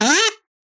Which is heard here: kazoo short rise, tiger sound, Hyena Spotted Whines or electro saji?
kazoo short rise